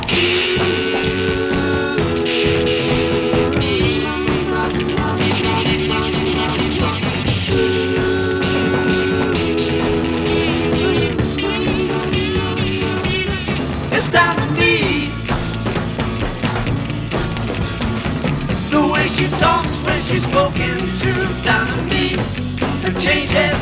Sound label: Lead Guitar Stereo Mix; Stereo mix version with lead guitar intact